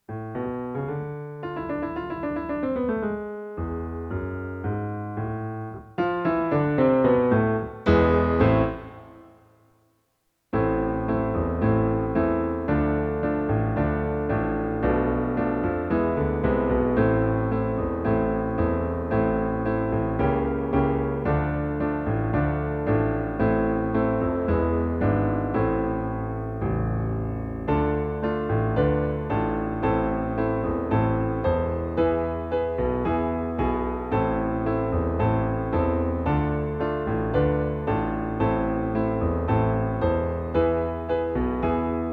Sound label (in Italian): base piano giusta